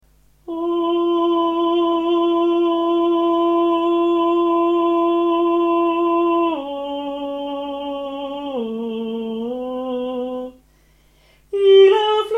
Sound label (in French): 4 hommes; ballade nord-irlandaise